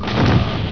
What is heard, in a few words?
ROAD